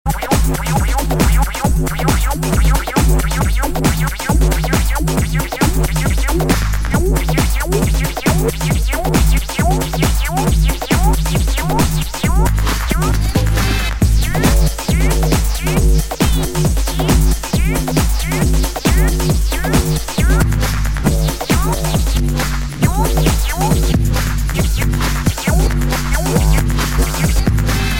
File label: electronica club, ringtones electronic